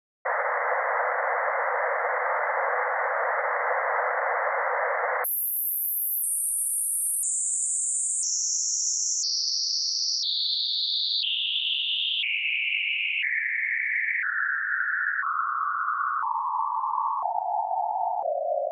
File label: Stereo center imaging; Stereo Setup and Imaging; Surround Spectacular